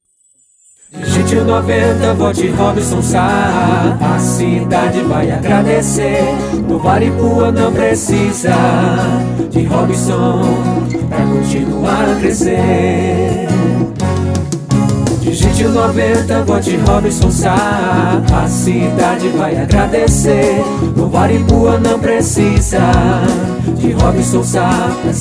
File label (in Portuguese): jingle eleicoes prefeito emotivo; eleicoes 2020